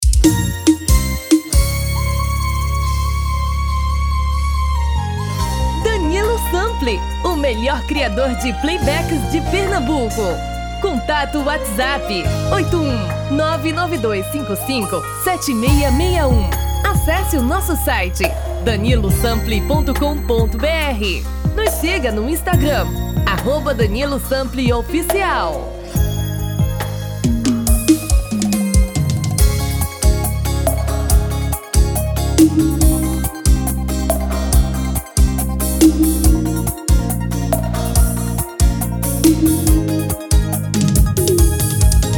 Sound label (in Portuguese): Brega